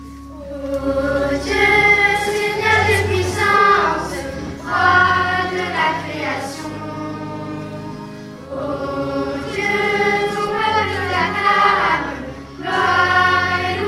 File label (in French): chant entree